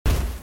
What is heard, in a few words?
hit enemy